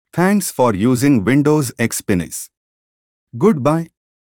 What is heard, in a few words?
windows xp shutdown indian